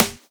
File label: Claps & Snares